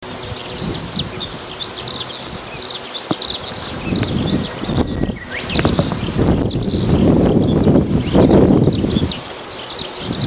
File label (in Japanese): hibari, birds